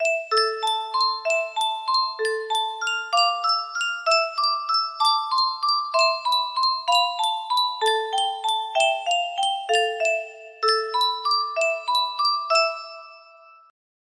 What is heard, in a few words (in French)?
boite a musique